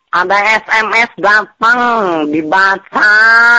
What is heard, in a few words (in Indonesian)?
nada dering jawa notification